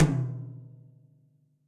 Tom Mid Direct Natural; Drums; Drum Hits; Ableton; Session Drums Studio; MPE

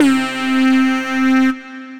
Miami Bite, Synth Lead; Ableton; Factory Packs; Build and Drop

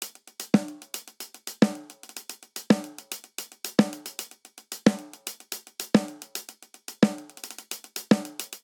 Classic Disco Snare & Hihat 111 bpm; 4 4; MIDI Clips; Ableton; Factory Packs; Drum Booth

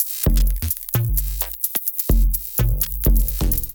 Beta Fish Kit groove 128 bpm; MIDI Clips; 122 - 130 bpm; Ableton; Factory Packs; Glitch and Wash

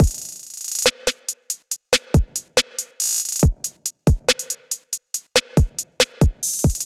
Kit 70 bpm, trap drums; Beacon Kit; Trap Drums by Sound Oracle; Ableton; Factory Packs

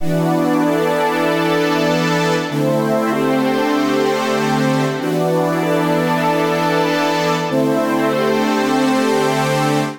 Space Pad E Minor 96 bpm; MIDI Clips; Tonal; Accompaniment; Ableton; Factory Packs; Chop and Swing

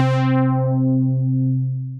Synth Keys; sAEw; Wavetable

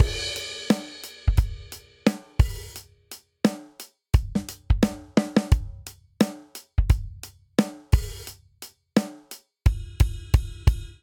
Rolling Hip Hop Chorus 87 bpm; 4 4; MIDI Clips; Ableton; Factory Packs; Drum Booth

